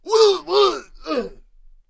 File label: Ninja Death Vocals